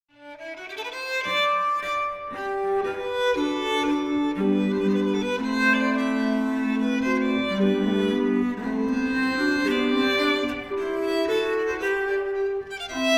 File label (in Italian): violono solo; Sonata Quarta a violono solo